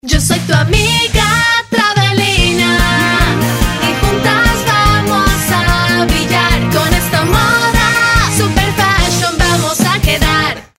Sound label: Jingle; Travelina 2008